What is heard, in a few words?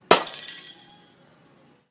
Lightbulb Break